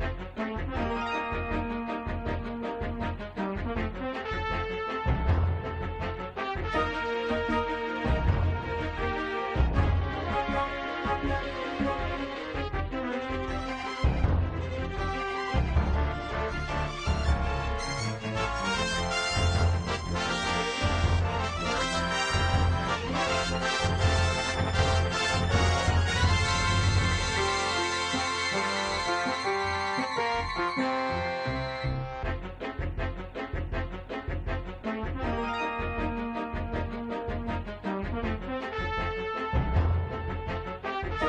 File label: off mode, music; WILLIAMS; INDIANA JONES; Indiana-Jones-Original